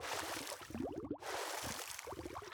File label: shark; Furcherro